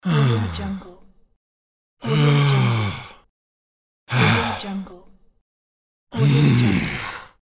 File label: male frustrated sigh